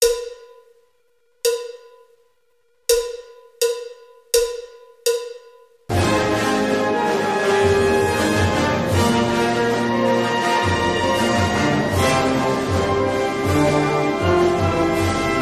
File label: Fanfare Tattoo end